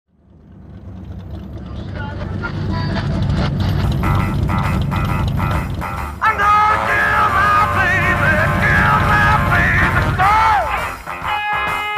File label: ROCKABILLY BLUES